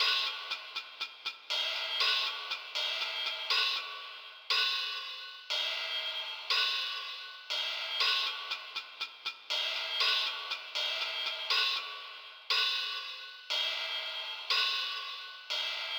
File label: Caves Loop Drums; BouncyBoi in Puzzle Land